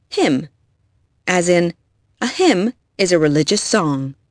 hymn

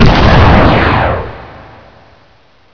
shot; Hexen II

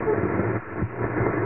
radio

